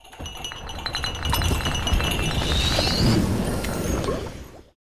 Magic generic laboratory ambience magic potion bubbling